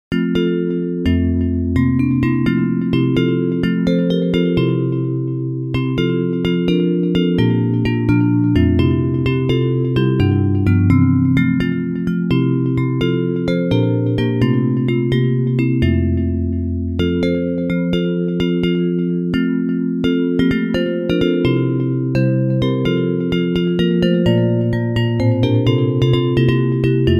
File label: Bells, Traditional hymn